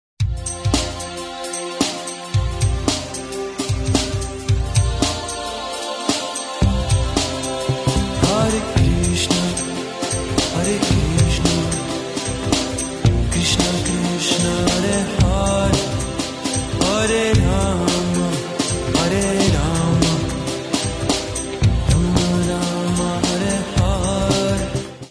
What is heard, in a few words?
Hare Krishna Mahamantra, Pop